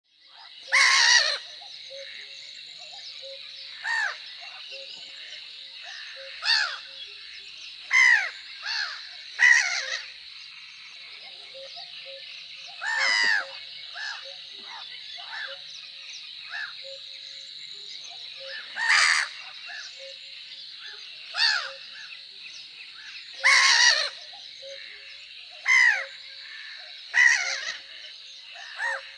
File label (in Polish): smieszka, birdsounds